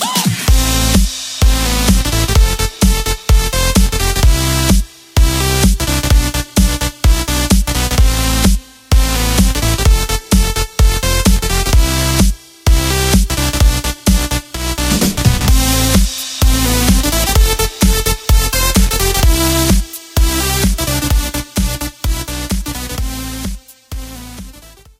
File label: End Level; dashy square